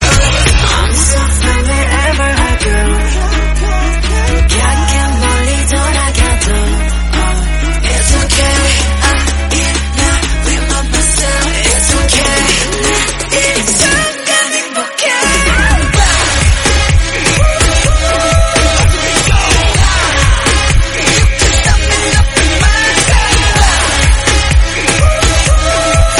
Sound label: Instrumental